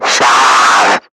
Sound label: Screamer Pain; Warframe; Lasrian